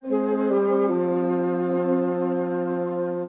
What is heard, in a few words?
flute